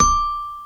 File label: Xylophone D3